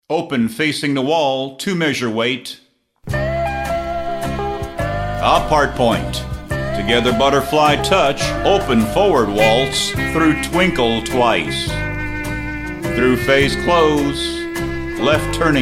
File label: vocal sample